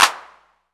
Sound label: CLAPS 808; E-MU Formula 4000 Series Vol. 2; OrbitPercMaster